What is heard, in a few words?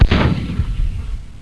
Missile Launch; Nuclear Hammer